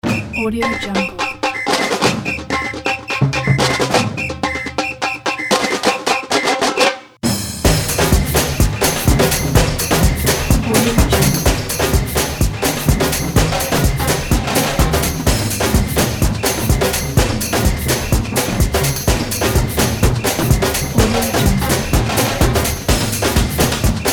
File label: drums of brazil; waderman